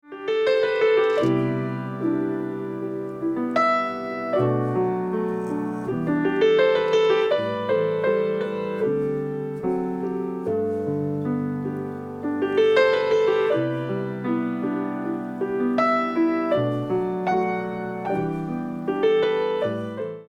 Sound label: piano